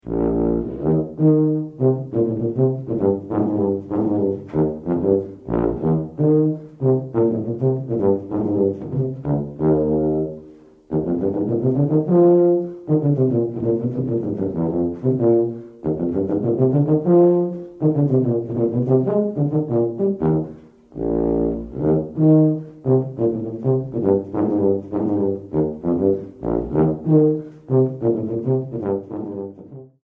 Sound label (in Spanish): Tuba; honduras musical 7mo grado